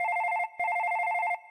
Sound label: Cellphone; zj the ball